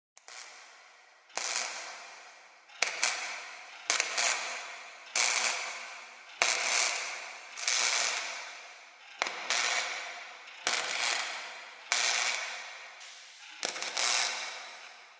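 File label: sounds of welding machine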